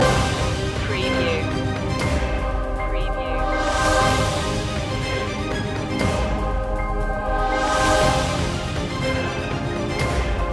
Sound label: last countdown loop